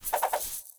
Grunt Foley Spin; Enemy; Characters; Corrupted Sproutfolk

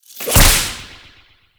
exalted whip impact; Warframe; Khora

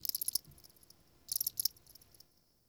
Insect Night; Warframe; Eidolon Plains; Ambience

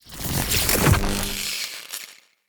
Voltaic Weapon Electricity Cast; Weapons; Enhancements; Elemental

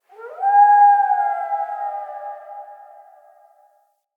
Wolf Cub Trapped Call Distant; Animals; Wolf Cubs; Orengal Wolf Cub B

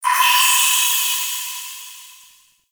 Distant Drip; Ambience; Warframe; Entrati

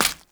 Step Default, Footsteps; Warframe; Grineer; Royal Jester; Foley

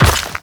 Step Dirt, Footsteps; Warframe; The New War; Characters; Kahl

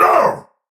Pain Heavy; Tau; Dax; Battle Barks

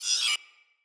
Hud Gear Slide In

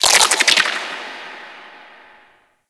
Ocean Underwater Plant Chatter; Warframe; Grineer Ocean

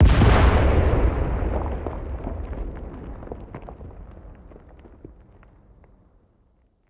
Bomb Distant Defacing Explosion; Encounters; Collector

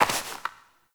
Step Dirt; Warframe; Koumei

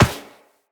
Walk Metal Perforated, Footsteps; Operator

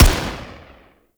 Arch Long Rifle Fire; Warframe; Weapons; Archwing

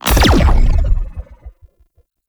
Alt Shot Fire, Weapons; Warframe; Cedo Prime

